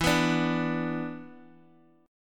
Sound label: E 5th 7th; E57 strummed; JGuitar